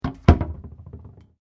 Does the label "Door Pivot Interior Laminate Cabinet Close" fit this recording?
Yes